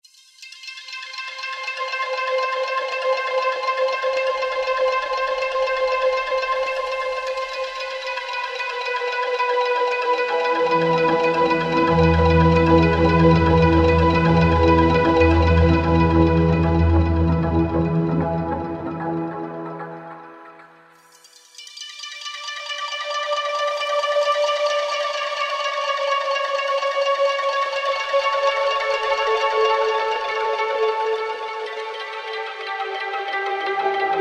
ME Treml, Yamaha TG500; Internal Voice I

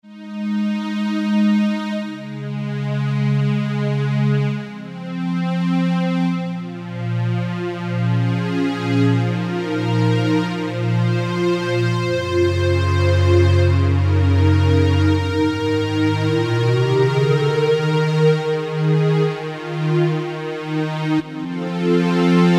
Warm 4th Pad, Korg microKORG; Factory Voices

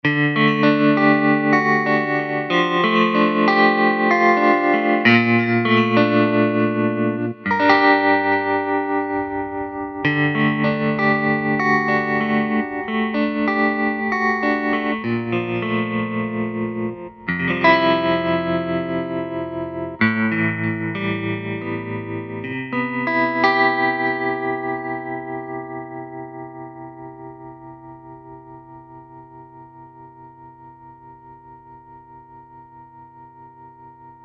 GUITARS Electric Tremolo; Apple GarageBand 2

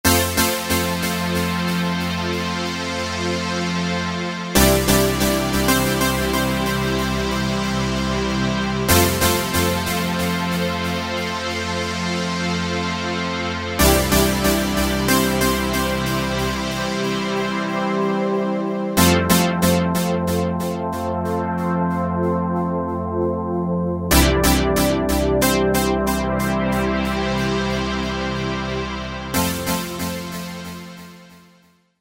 Slippy Pad, Korg microKORG; Factory Voices

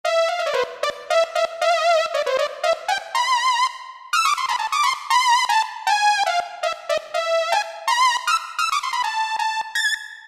Synth Lead, Roland PN-D50; Roland PN-D50-01

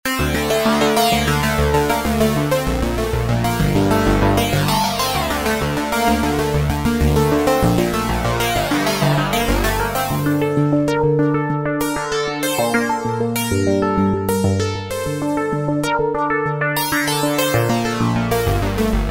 Sine syncer, Roland V-Synth; Factory Patches

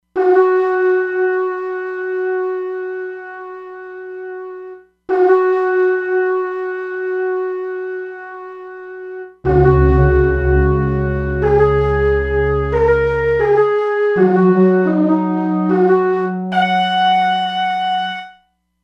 Neydashofar, E-mu Proteus-3; Factory patches

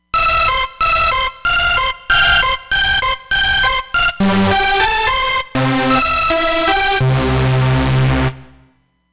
Clean

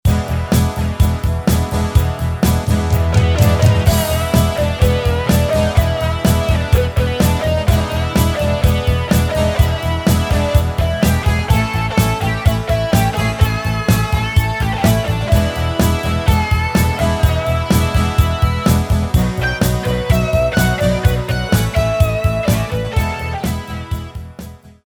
Root Rock; Style

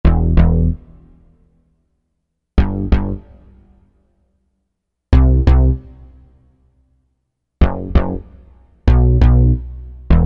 Synth Bass, Korg M1; Factory preset examples

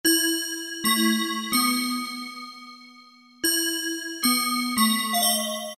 REVERBELL, Kawai K1m

